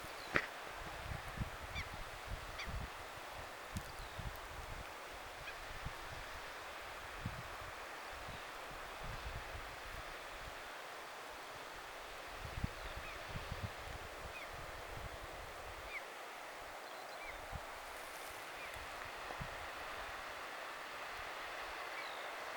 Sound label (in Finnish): aanteleva kiuru rantaniitylla